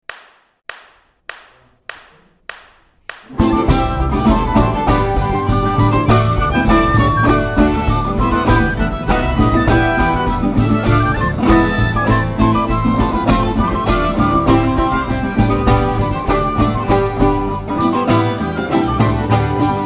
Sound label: orchestra